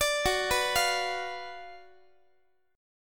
Gb Major 7th Suspended 4th Sharp 5th Gb Guitar

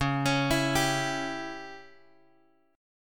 C sharp Diminished C sharp; C#dim Chord {X 4 X 6 5 3} arpeggiated

